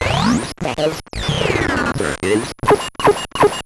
Bent Talking Teacher, Circuit Bent

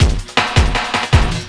LOOP L, Drum & Bass 160 BPM

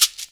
SHEKERE; InVision Interactive; Percussion Slam

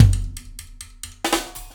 Warp Drive Beat, Processed Drumkits

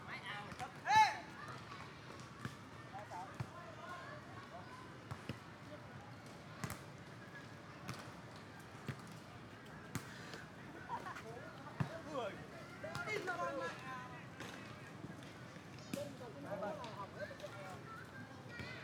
Field, Ambience, Two Socce; SPRTField; City Sounds; M8

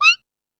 Dance 2000; E-MU Pro-Cussion

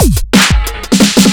Speed loop fill, Drums Beats

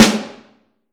Vintage Snare Drum, 3.5X14; Q Up Arts; Johnny.C's Vintage Snare Drum

